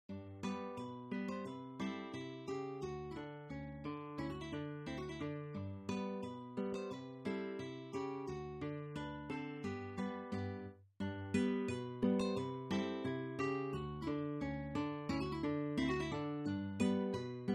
polka

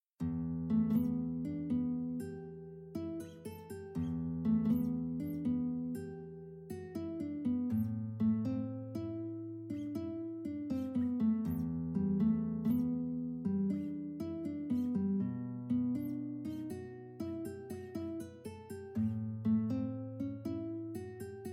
swingin blues

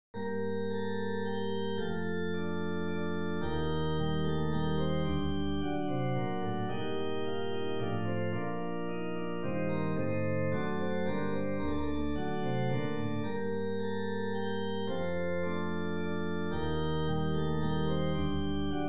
menuet

